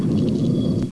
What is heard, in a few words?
push glass; XDM